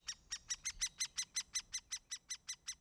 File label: bird, ambient